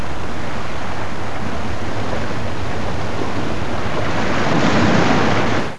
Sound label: Waves; Ocean Bound v1.0